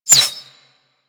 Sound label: Arrow Whizzby; Warframe; Weapons